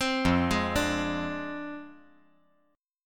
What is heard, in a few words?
F Minor 6th F down